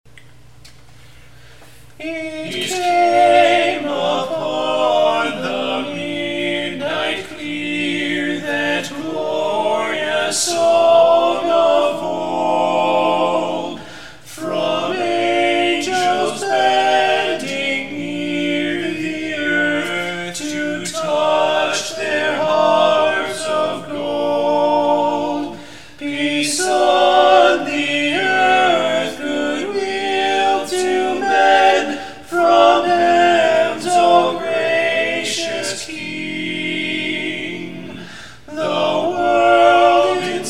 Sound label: tenor; it came upon the midnight clear